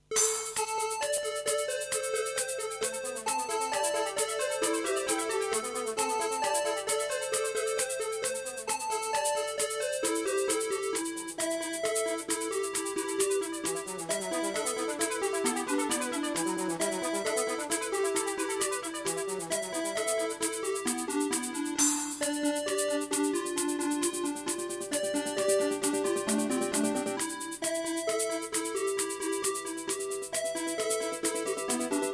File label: EMU Sound Engine; System Shock